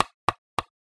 tack tack tack

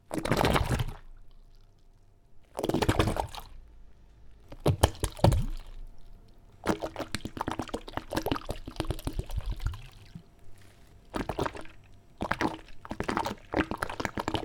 pour out water

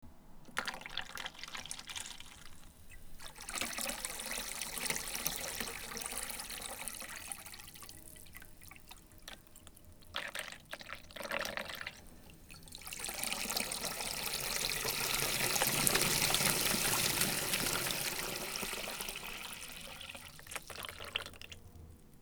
water in mug in sink

